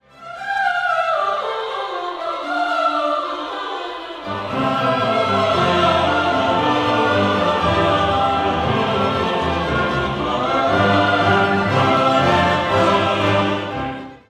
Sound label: Credo Amen